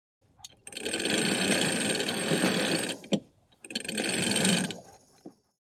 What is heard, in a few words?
Ship exterior steel winch heavy creaking; boat